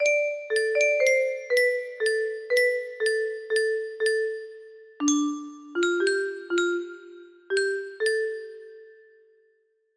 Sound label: music box melody; tuck everlasting